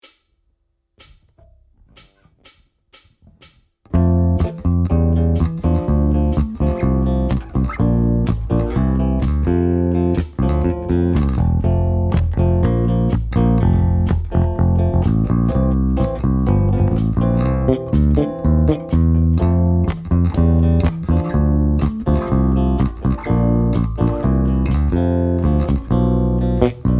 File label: BASSLINE